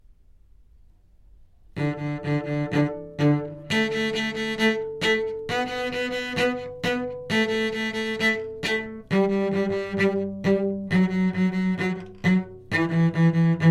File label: Twinkle Icky Cello